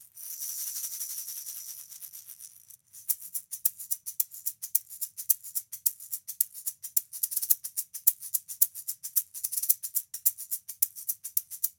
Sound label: Shaker; se electronics vr1